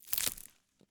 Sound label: Plant Harvest